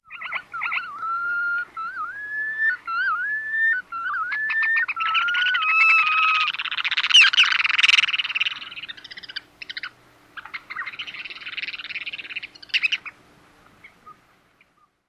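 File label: REDKNO song and calls, red knot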